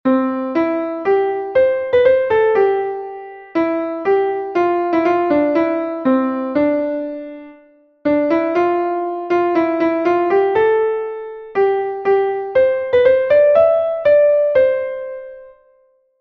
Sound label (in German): klavier; MelodieMeister